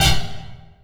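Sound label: Bleuy Oneshot, beats; Sonic Foundry (Sony Creative Software); Esoterik Beatz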